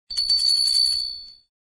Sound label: Wind tone; Samsung SGH-D980 DUOS